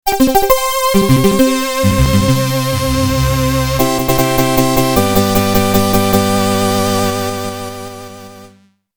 Harvest Ceremony Fanfare; DOS

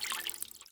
watering; Plants vs. Zombies

